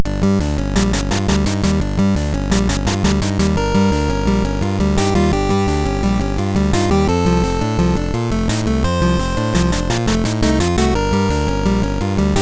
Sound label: Cave; oni ii innin densetsu; gb